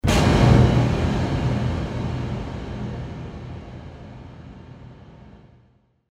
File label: ambient noisy ambient impact